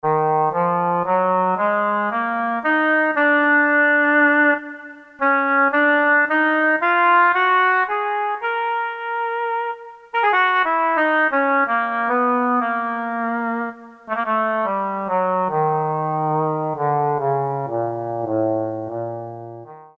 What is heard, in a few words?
Oboe; Reeds 1